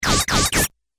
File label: Velcro Laser Blasts; Sci-Fi; Andrew Fleming